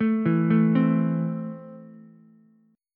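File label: A Minor, Ukulele, chord strum; 2,4,5,3; JGuitar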